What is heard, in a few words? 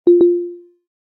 wireless charging disconnected, phonetones; LG V60 ThinQ